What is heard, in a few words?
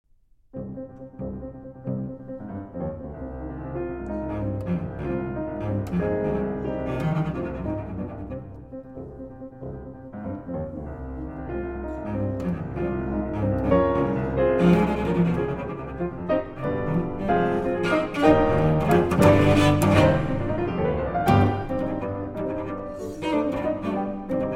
Cello Sonata in G Minor, Allegro Scherzando